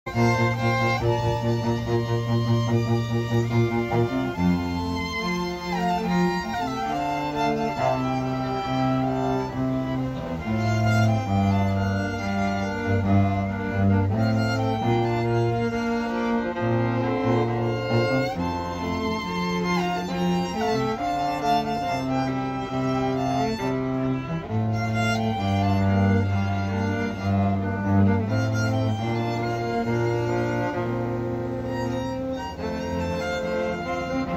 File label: String Ensemble; classical musicians